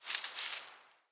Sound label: Wetland Forest Day Vegetation Scurry; Midrath Island; Ambience